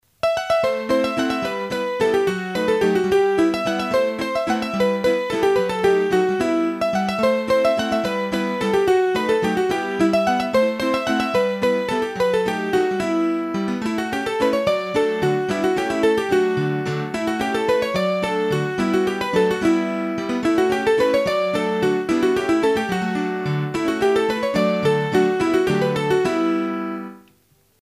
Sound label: Mazurka Limousin, hurdy gurdy; Massif Central; Mazurkas